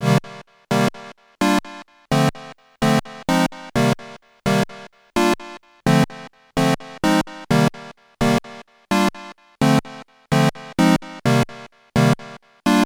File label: korg minilogue sound hit